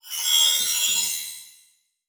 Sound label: Digital; Adobe; Multimedia Internet CD-Rom Flash